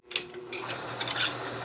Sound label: engine start, sci-fi sounds, mechanical